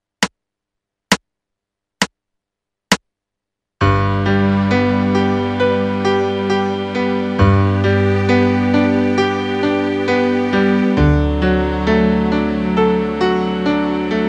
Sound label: 16th note etude backing at 67 bpm; David Oakes book